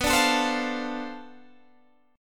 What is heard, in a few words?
B Minor 7th Flat 9th Flat 5th B, guitar chord